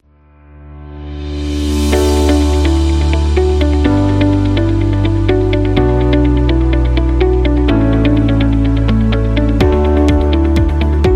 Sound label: Soulful Vibes